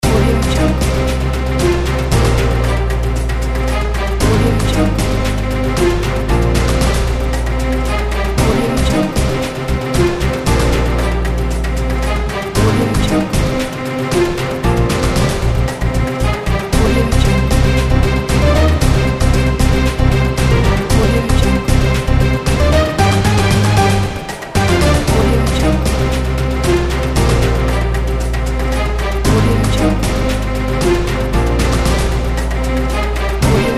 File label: fight battle scene